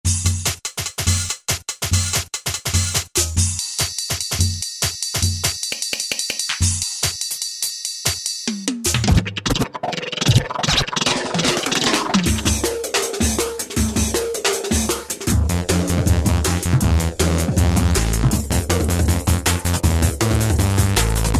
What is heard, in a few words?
Jungle, Alesis Z1 Hip Hop